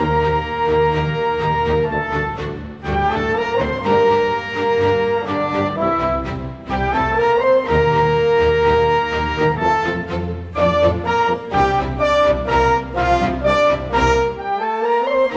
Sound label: Rock Pop, Orchestral